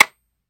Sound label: weapon disconnector; gmod; viper